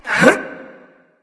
gruntjump; smite; voicepack; mercury

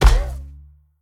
foley land bounce; smite; odin

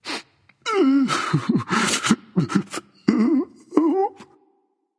woohoo; smite; voicepack; poseidon

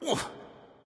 Grunt; smite; loki

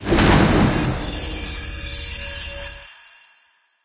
lobby landing; smite; chronos

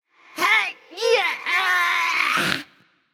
grunt lift; cupid

